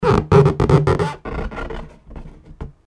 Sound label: scrunchy